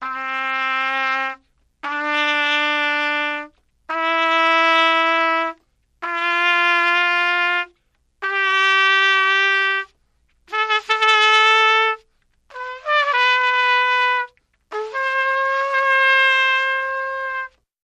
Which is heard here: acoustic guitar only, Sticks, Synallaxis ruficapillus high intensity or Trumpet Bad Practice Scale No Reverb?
Trumpet Bad Practice Scale No Reverb